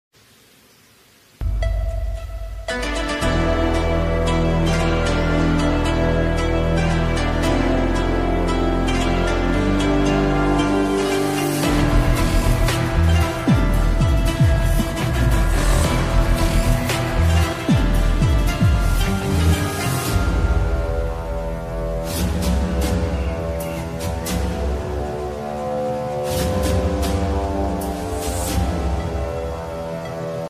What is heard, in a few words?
attitude ringtone bgm ringtones english ringtone